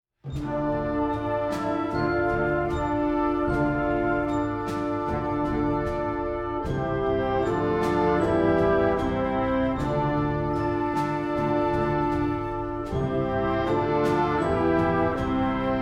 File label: desert wind, Concert Band